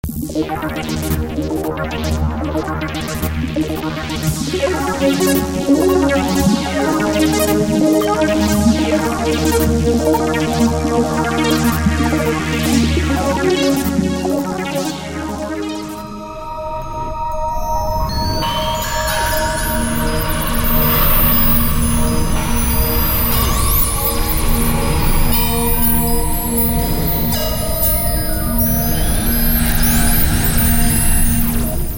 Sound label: s90 atmospheres